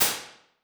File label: Wide Room; Convolution; Small Rooms; IR Samples